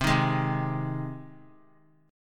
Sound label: C Minor Sharp 5th C down Guitar; strummed; JGuitar